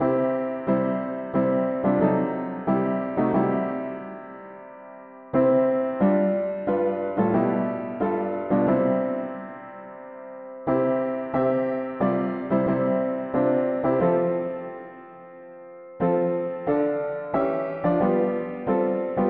mono piano, hymns; Nous n avons pas a demander

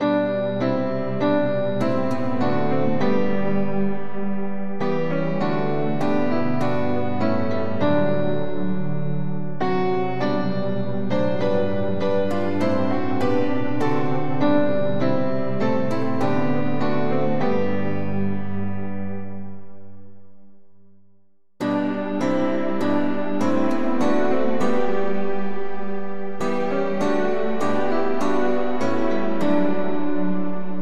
polyinstru; hymns; Grace taught our wandering feet

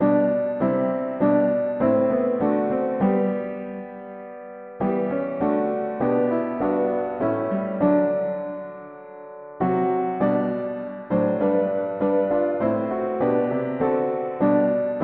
mono piano, hymns

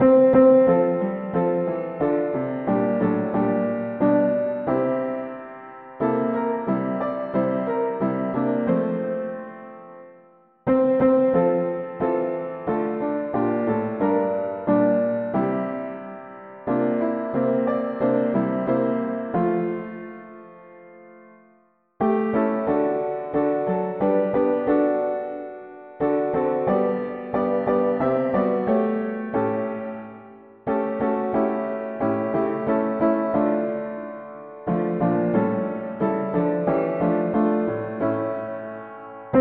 mono piano; hymns

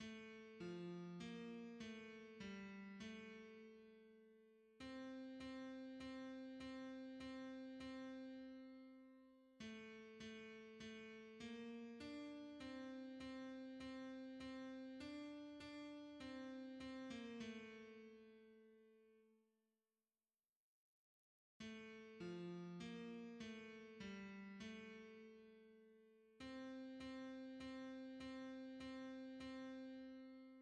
hymns, tenor; Not to ourselves we owe

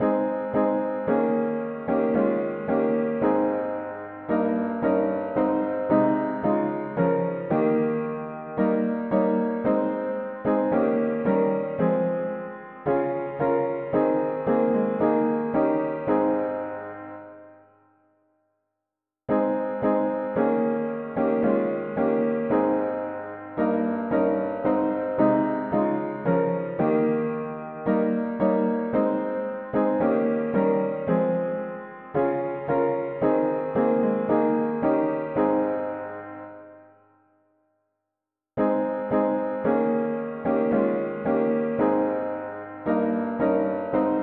mono piano, hymns; Christ delivered us when bound

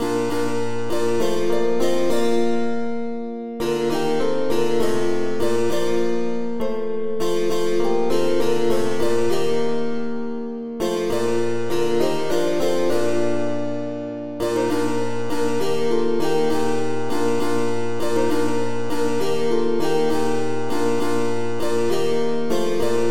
polyinstru; hymns; On earth the song begins

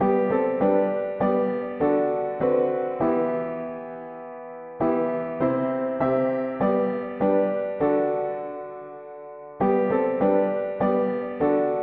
mono-piano, hymns; Lord Jesus Lamb of God

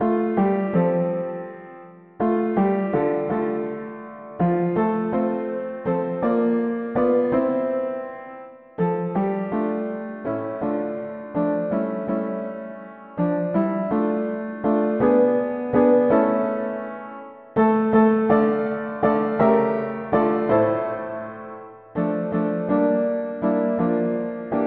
mono piano; hymns

